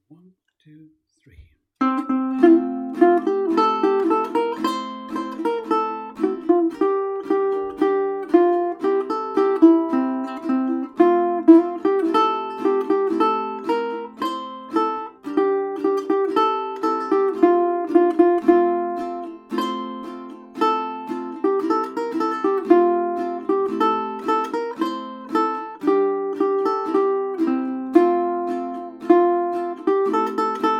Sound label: Melody Practice File; Simple Gifts